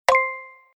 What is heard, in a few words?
btn Click; 1casino-first-cubes